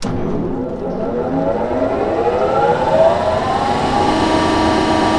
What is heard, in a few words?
gauss spinup